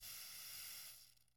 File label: spray long; angband